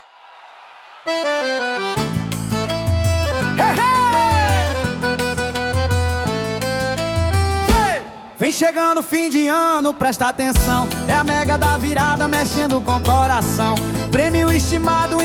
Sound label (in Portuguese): Forro; Mega da Virada